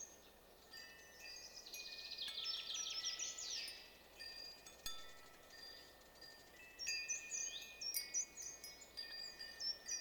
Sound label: forest